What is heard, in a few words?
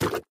Mud Bouce